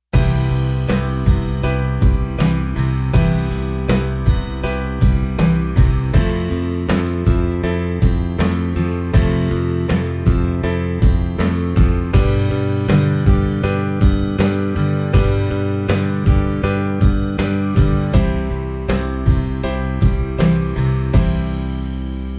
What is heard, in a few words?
cmaj7 fmaj7 g c